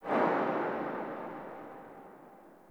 Charge Gun Tail B; Crp Charge Gun

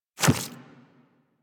Infested Small Walk Default, Footsteps; Warframe

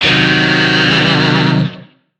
Shawzin Blues Chord; Warframe; Lizzie Shawzin

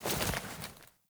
Foley Action Roll, Default Clothing

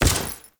Step Default, Footsteps

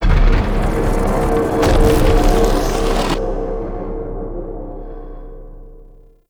Spawn Warning; Enemy; Nimrod; Abilities

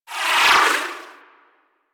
Rocket Whizz By; Warframe; DE; Vehicles; RailJack; RailJackEnemyShips; Grineer; Space Fighter Dredger Agent

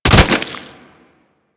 Infested Devourer Swing Hit A; Warframe

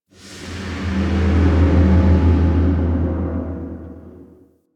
Distant Pipe Burst; Ambience; Warframe; Corpus Railjack

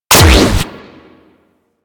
Fire; Warframe; Acrid

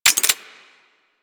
Air Pistol Mech, Weapons; Warframe; Corpus

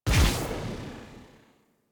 UI Theme Sweetener Two; Zephyr; Warframe

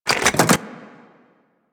Heavy Grenade Launcher Reload; Warframe; Archwing

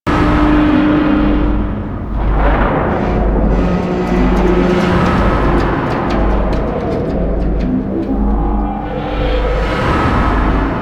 Void Dragon Body Loop; Warframe; Duviri

